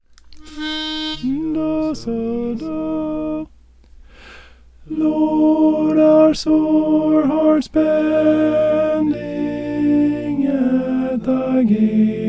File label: alto